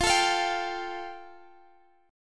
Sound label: F Major Add 9th, Guitar, Standard; strummed; JGuitar